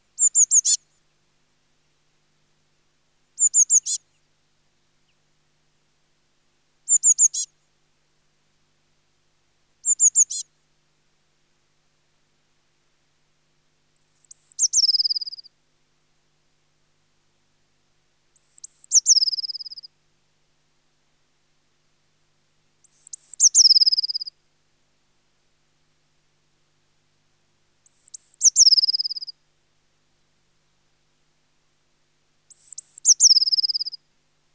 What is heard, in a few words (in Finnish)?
Sinitiainen; lintuja; nauhalaisen aanimaailmat